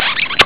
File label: win